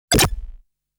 Eggs!! Sound coming out

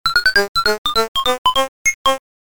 Round Clear MUSIC; Dig Dug; arcade

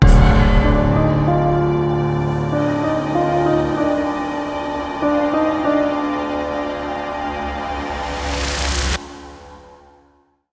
String

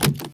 SFX Door Car Locked; this is the zodiac speaking 2020 gamerip switch ps4 xbox one pc macos

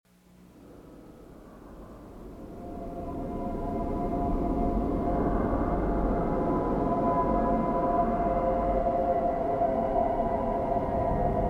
Warning; Fallout Tactics

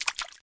Water Splash; Cut the Rope Time Travel

